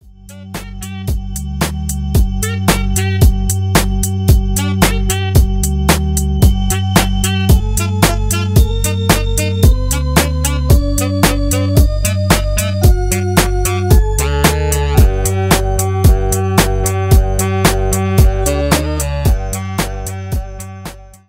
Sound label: Instrumental